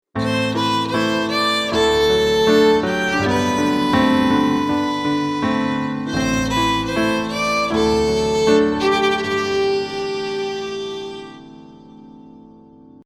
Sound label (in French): duo piano violon; loto sonore